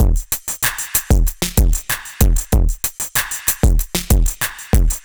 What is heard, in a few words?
Machine Drums, 95bpm, analogue circuit; musicradar